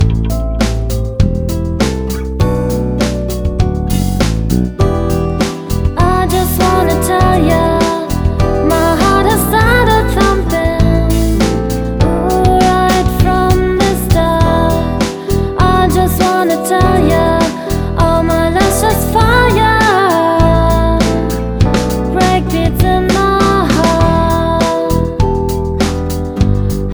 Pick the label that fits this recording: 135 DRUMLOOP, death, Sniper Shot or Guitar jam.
Guitar jam